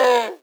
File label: screams human